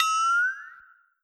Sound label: question mark